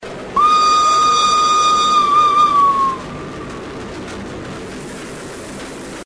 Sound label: Swiss horn